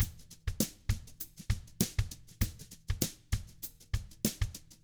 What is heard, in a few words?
FUNKY, Drums